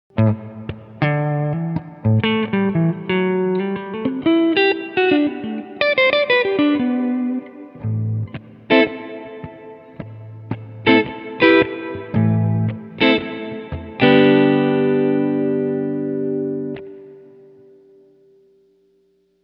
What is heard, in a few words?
hall; bigsky; hall reverb; Empty Auditorium